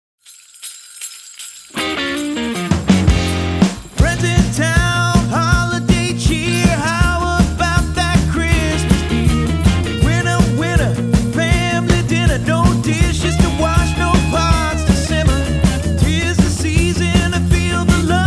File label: jingle holiday; Little Pub